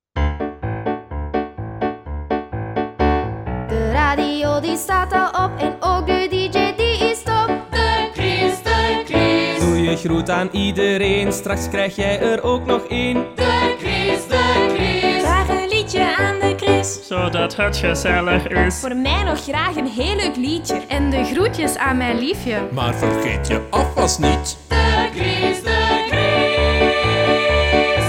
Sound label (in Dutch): Djingle Radio